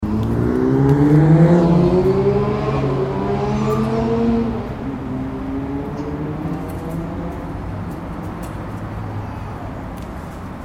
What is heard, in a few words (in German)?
motorradlaerm zwischen den rampen nahe der ampel; gruppe rot